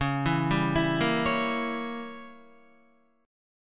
C sharp Diminished 7th C sharp down Guitar 4F 4A 5C 5G 5C 6E; C#dim7 arpeggiated; JGuitar